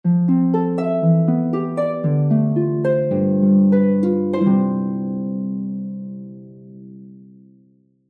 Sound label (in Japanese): 休む 休憩 寝る ハープ